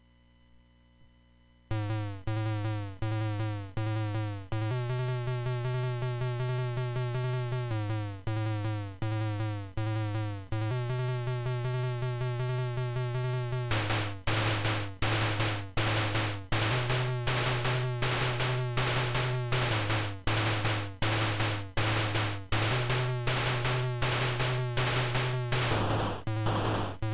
demonstrative percussion with unintentional explosion; weeklybeats-2012